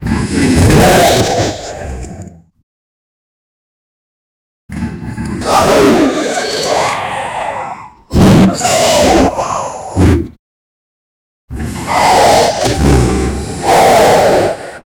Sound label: the sounds of monsters fi, combat